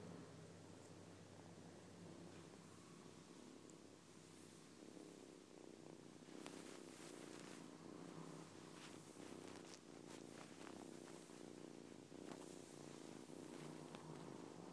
purring kittens

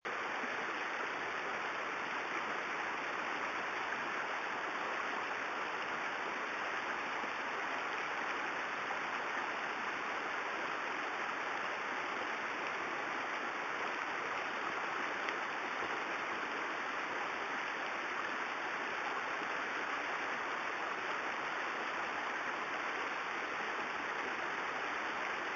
stream

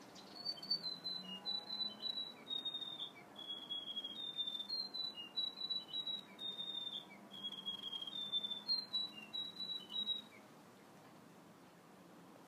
riroriro grey warbler

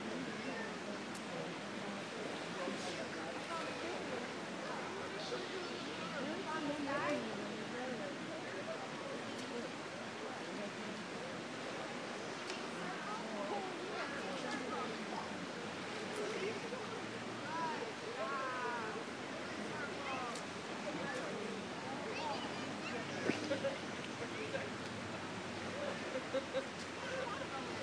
swimming hole ambience

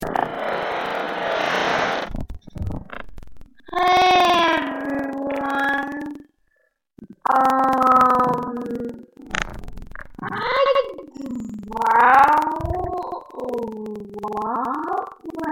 angry growl

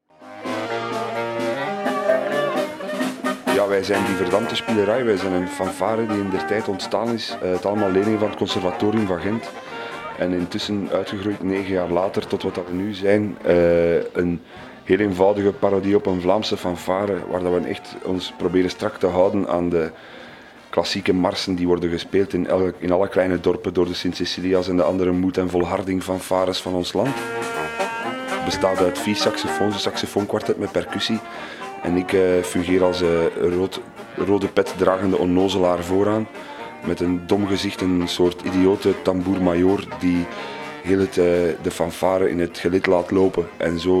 broken brass ensemble

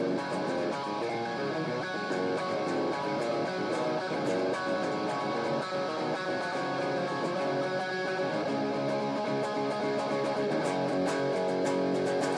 recent jamming pop punk

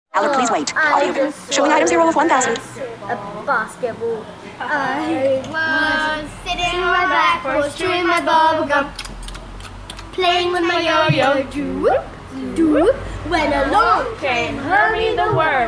Singing people

